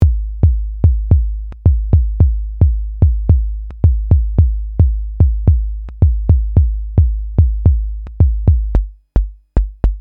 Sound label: Bass